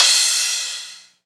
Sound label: huge crash cymbal sample c key